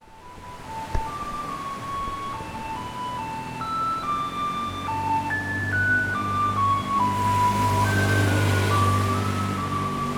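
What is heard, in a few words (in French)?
l'usine abandonnée de moganshan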